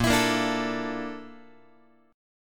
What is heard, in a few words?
Bb Major Sharp 11th Bb down Guitar, chord; strummed